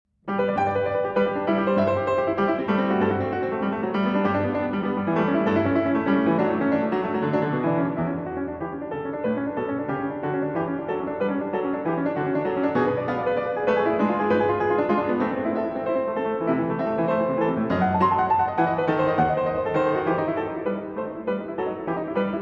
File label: Prelude in G Major; Prelude & Fugue in G Major, BWV 860